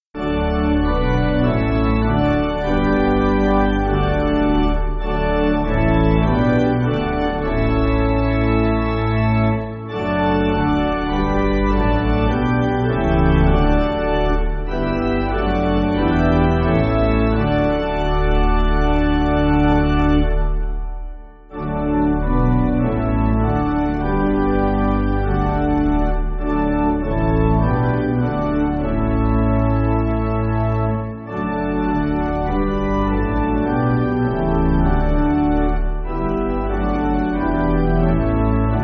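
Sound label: Pipe, Pipe Organ, Music Backing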